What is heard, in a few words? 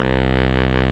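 big band fast baritone sax; Roland L-CDC-01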